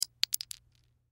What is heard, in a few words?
Bullet Shell; Bullet Shell 9mm Drop Cement; Drops (Sound Effects:Objects); Blastwave; Warfare